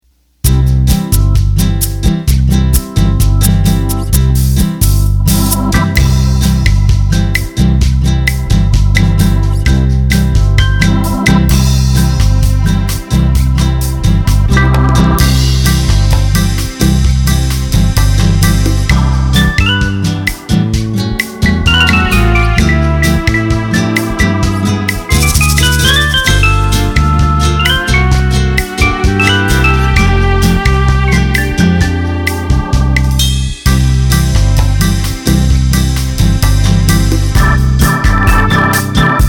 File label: Bossa Nova; roland bk7m